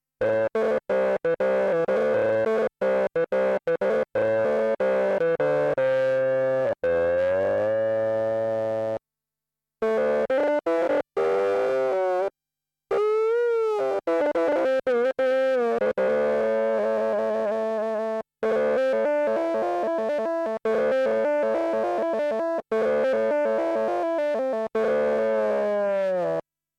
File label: MS-20 distortion lead; distortion lead, assez simple à réaliser grâce à une vieille astuce; Démo audio des fiches didactiques de sons analogiques détaillées dans le livre